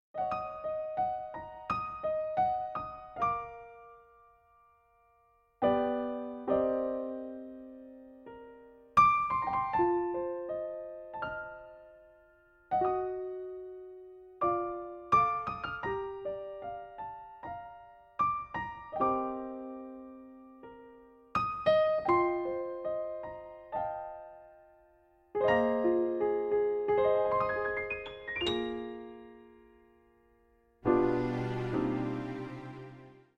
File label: Does Anybody Love Me Instrumental